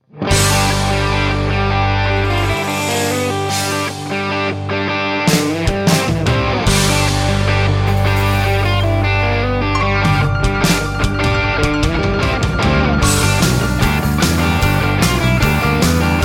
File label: Medley (G To A) No Backing Vocals